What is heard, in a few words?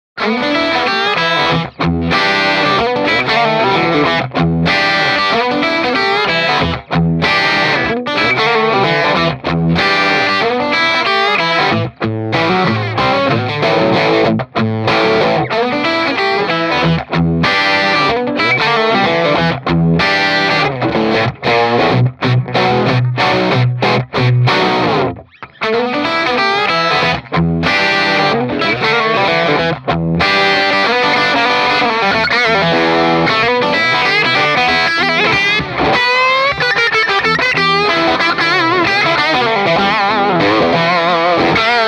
Sidewinder - Mid emph; mojave